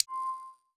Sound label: Splash Click; netent